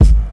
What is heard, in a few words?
hq subby kickdrum sound g sharp key, bass drum single shot tuned to the G# note